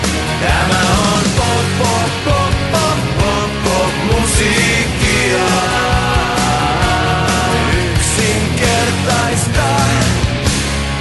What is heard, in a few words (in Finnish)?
Pop Musiikkia